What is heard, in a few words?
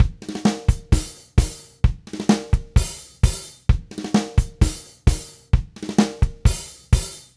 Tango, Latin Percussion, Drumset; Sonic Foundry (Sony Creative Software); Joe Vitale Latin Percussion